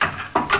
pliers pickup

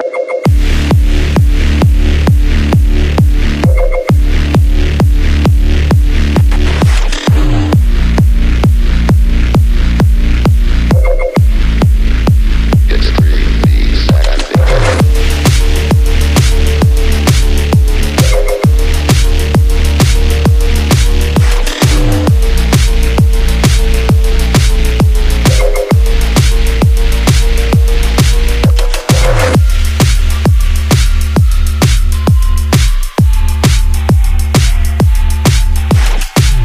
hev beat; sven; exodus

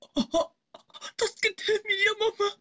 die; votemap; kani

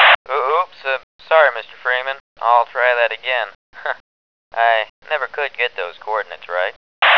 quake

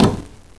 player ladder

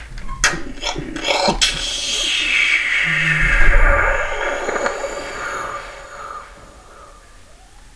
explode

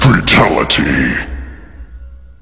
fatal

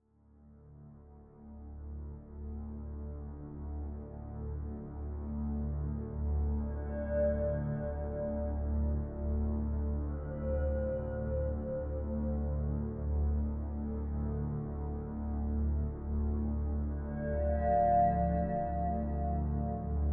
song; wartso

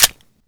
mag hit, beretta; guns; cof

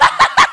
awkward laugh

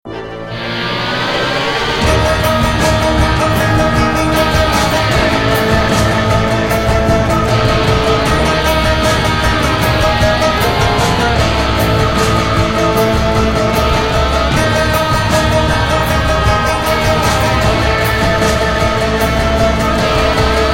game victory; sven

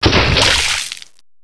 mortar hit; tubequeen

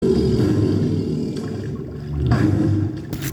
Alien amb eau; Resurgence

